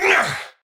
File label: Attack Effort, Battle; en; Tau; Tau Dax Knight